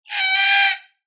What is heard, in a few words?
animals birds cockatoo squawk